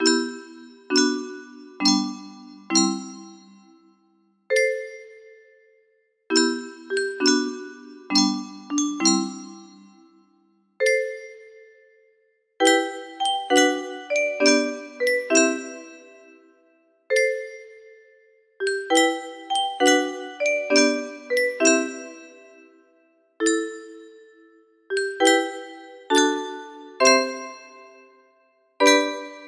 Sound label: music box